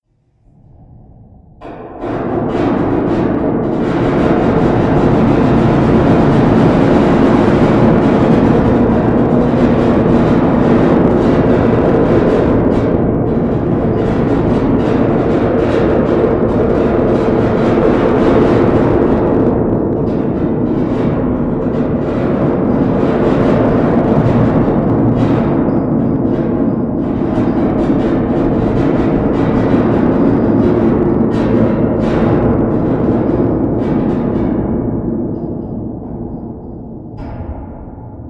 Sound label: Scraping rocks, with heavy reverb